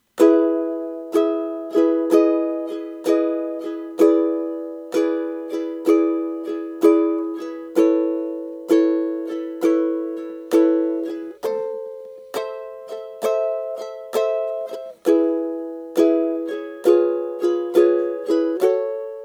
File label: Intro Strum, ukulele